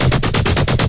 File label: drumbreak, 130; Ueberschall; Techno Trance Essentials